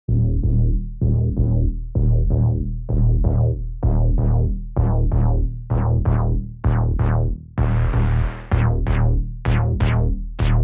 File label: Bass Tool